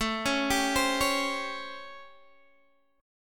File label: A 7th Sharp 9th A, Guitar Standard; arpeggiated; JGuitar; 17,16,17,17,14,x